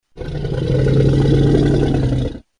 dragon

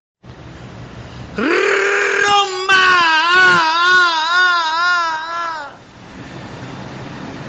ah ah ah ah

